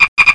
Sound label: GOOD FROG; PUZMANIA